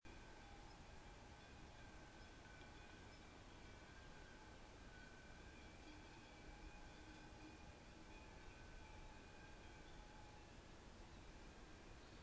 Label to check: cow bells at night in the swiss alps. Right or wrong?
Right